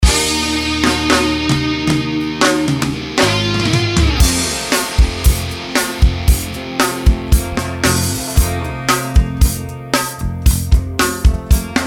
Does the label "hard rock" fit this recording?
Yes